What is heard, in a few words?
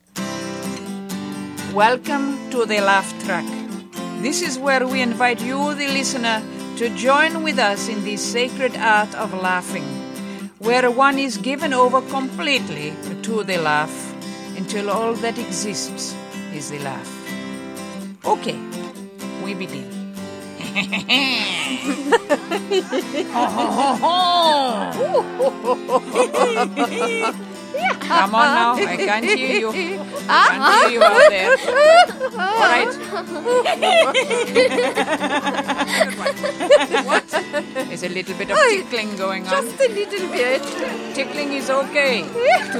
LAUGHING EXERCISE